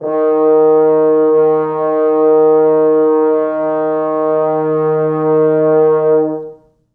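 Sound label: 4 French horns sustain D#4; East West; Quantum Leap Horns Sax; 4 FrHorns SUS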